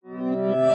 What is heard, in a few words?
reverse grand piano